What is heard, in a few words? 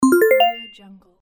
completed